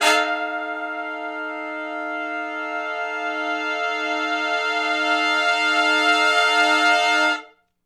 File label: 2 ms LN w Swell (F) har-dom 7th, Horncraft for R&B, 2 measure Long Note w Swell; Crimson Blue and Fabulous Horncraft 4 RnB; Sections